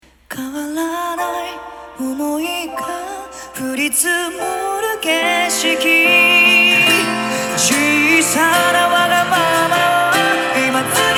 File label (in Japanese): ファルセットミックス